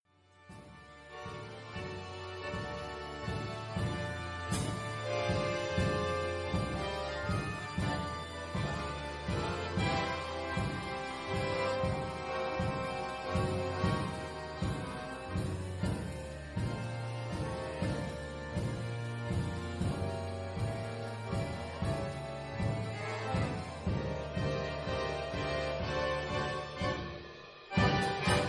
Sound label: Heavy tango